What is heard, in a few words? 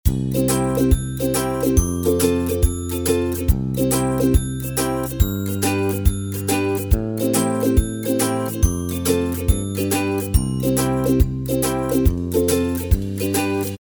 Just Happy Loop